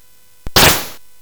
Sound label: Space Gun